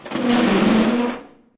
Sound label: push box, debris; svencoop; echoes